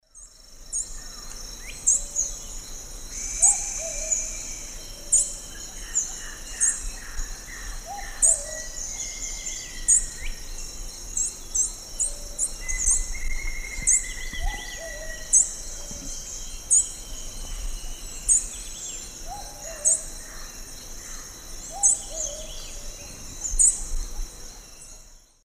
Chlorophanes spiza, calls; S. America; Eastern Lowlands

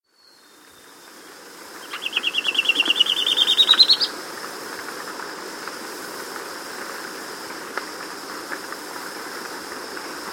Rufous Gnateater; S. America

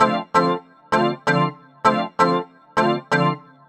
Synth, Techno

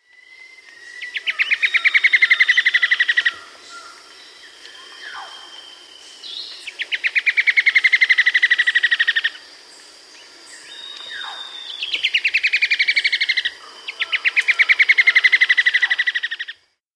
White-Flanked Antwren, S. America, Natures Songs

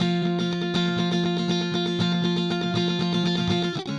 Clean Guitar Strum

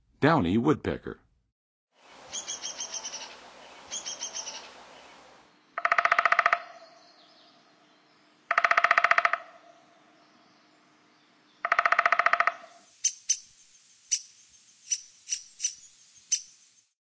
Downy Woodpecker; North America; Western USA; Western USA Songbirds

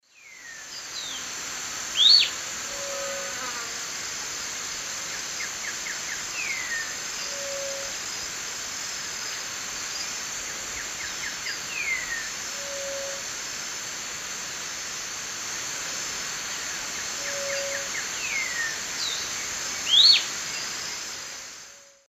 Cnemotriccus fuscatus, call or song; S. America; Eastern Lowlands

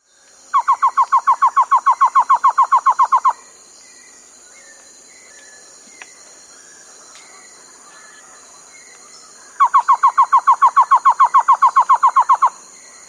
Cocha Antshrike; S. America

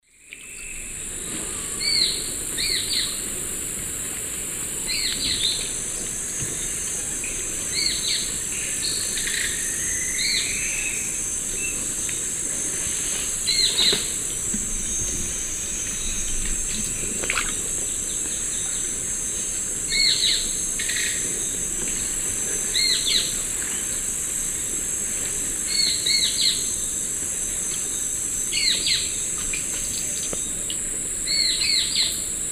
Myrmotherula multostriata, calls; S. America; Eastern Lowlands

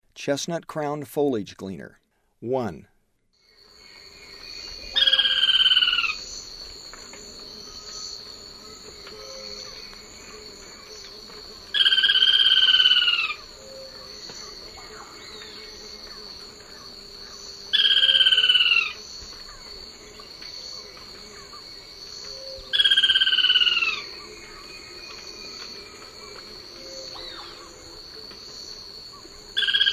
Chestnut-crowned Foliage-gleaner; S. America; Eastern Lowlands

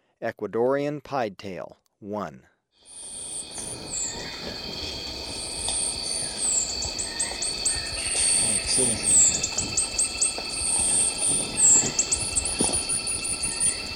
Ecuadorian Piedtail, Birds of Ecuador; S. America

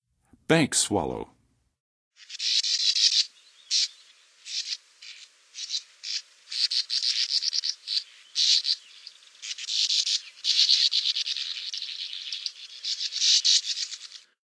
Bank Swallow, Western USA Songbirds; North America

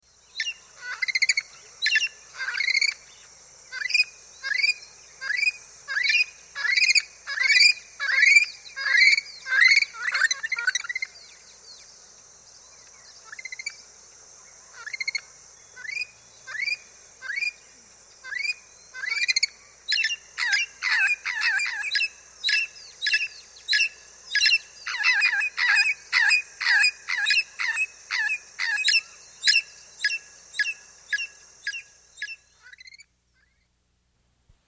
Red-Lored Parrot; S. America

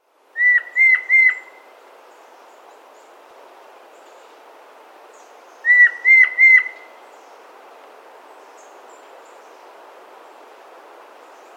White-Bellied Antpitta, S. America

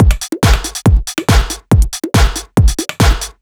Complete Loop, Dance Beats

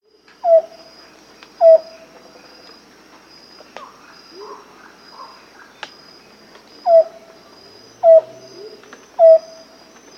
Amazonian Antpitta; S. America

